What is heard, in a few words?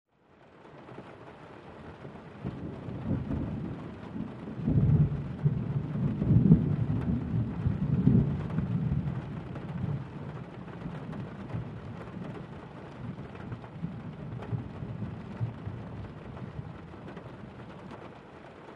Rain Light On Metal